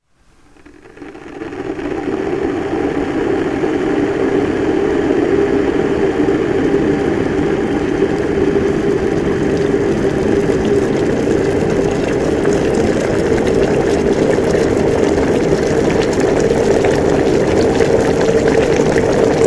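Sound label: sound of kettle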